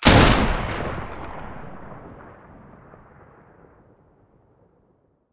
muzzle pkm stereo

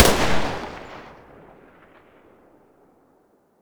muzzle vector mono, weapons

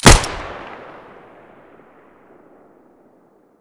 muzzle fiveseven stereo, weapons

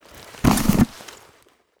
pistol mask use; STALKER; IWP; item usage

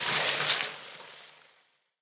extinguish campfire; STALKER; IWP

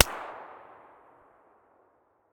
usp45 silencer mono far

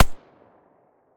silencer mono distant, weapons

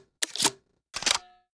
protecta load, weapons

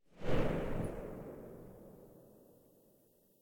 silencer g36 mono very far, weapons

